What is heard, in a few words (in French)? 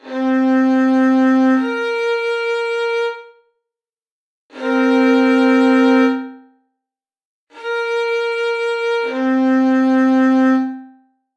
7m septième mineure